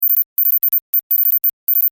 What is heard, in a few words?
Science Fiction Teletype Readout Beeps High Pitch; Communications